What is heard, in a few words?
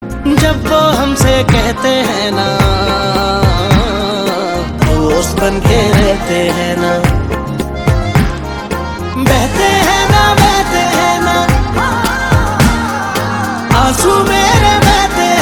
HINDI SAD RINGTONE